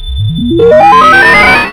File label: activate; yage